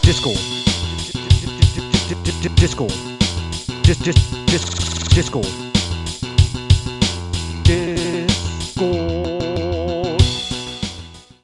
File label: jingle; Discord